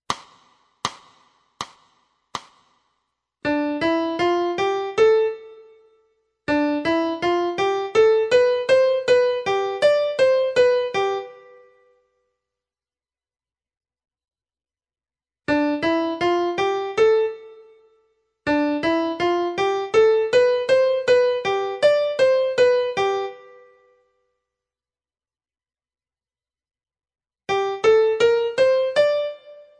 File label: piano